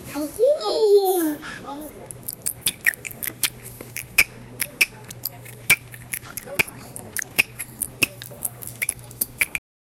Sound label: realistic sound, chinatown